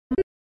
OS Sound Notification

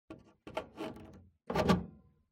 PC Hardware Power Jack Plug In

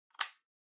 Portable Radio Earphones Plug In

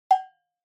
Cowbell High

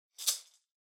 Maracas Single

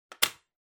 Cutter Put Down

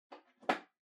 Pendulum Clock Hardware Far Key Holder Put In

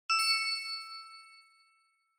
Bell Accent Low

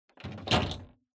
Air Conditioner Panel Open

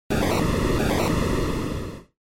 SNES RPG Magic Fire

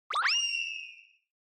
Motion Blow Away Long

